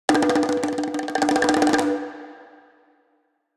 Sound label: near win bongos; rise of maya